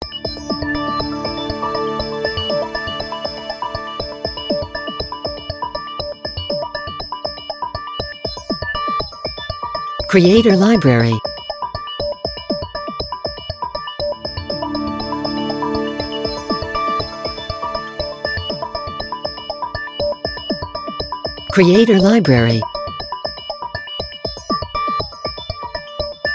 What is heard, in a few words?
TV Countdown